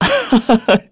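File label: vox Laugh, Korean; Lucias; kingsraid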